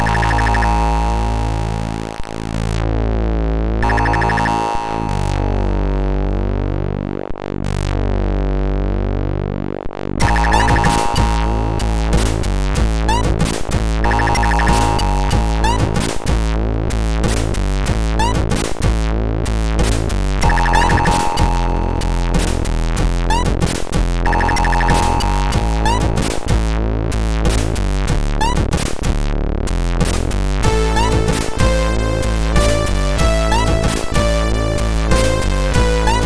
Late at Night, MOS6581R3; hvsc

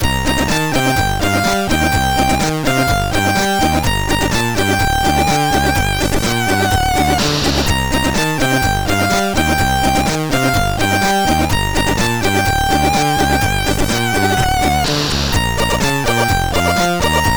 Takt, CSG8580R5

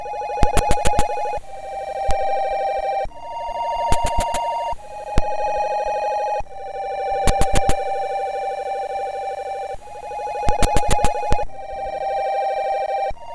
sid, MOS6581R2; Secret of Monkey Island

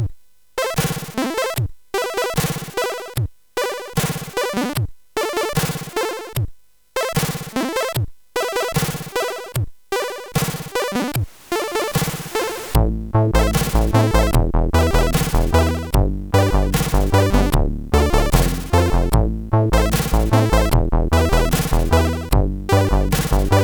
Laughter CSG8580R5; hvsc

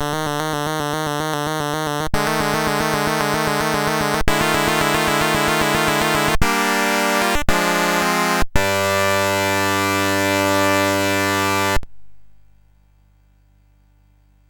sid CSG8580R5; Perry Mason Mandarin Murder

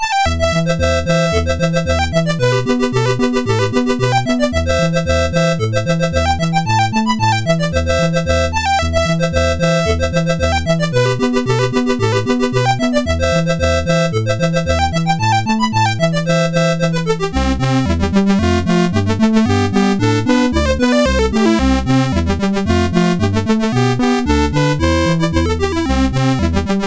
French Jig, MOS6581R4; Joseph A Grau

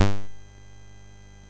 sid MOS6581R3

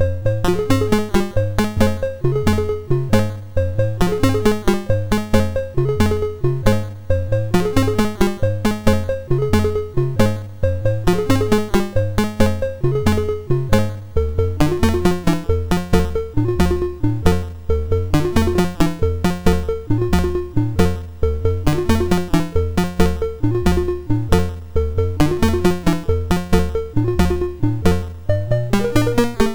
Randomly generated music BASIC, sid MOS6581R2

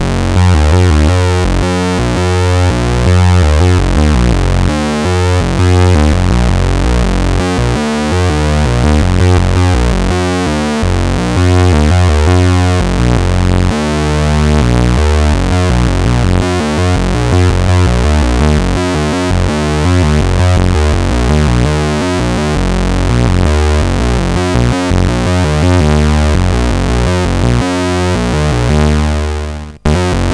BASIC, CSG8580R5

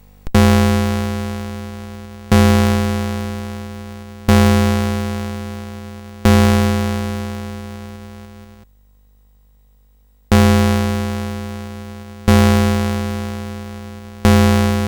Ding Dong Bells BASIC, sid CSG8580R5

